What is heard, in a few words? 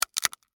Crack Crunch Single, Texture, Crack, Crunch, Single, Penne, Rigate; Food; BlastWave